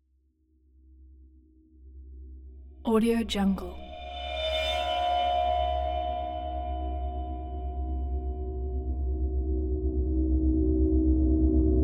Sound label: swell; prosoundfx